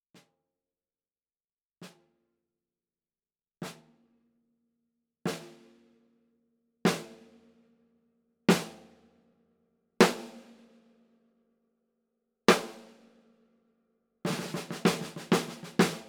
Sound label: Rode NT5 overhead left medium